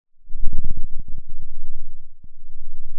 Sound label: pop